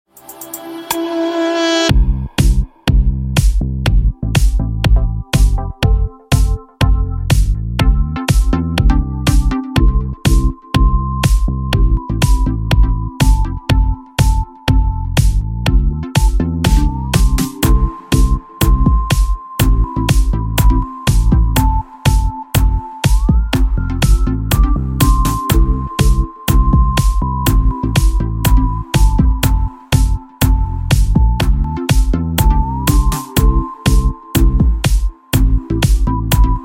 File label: Disco Track